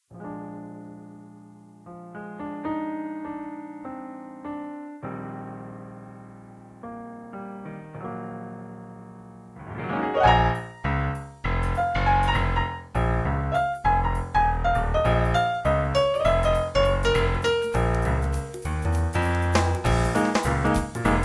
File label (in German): groove klavierversion